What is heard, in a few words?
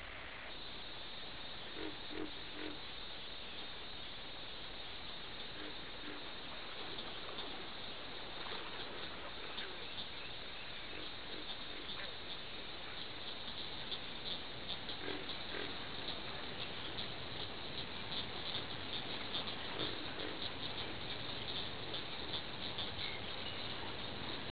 crickets